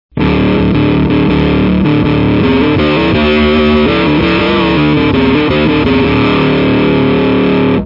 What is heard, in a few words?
ring